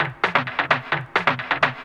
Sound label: percussion, 130, drum loops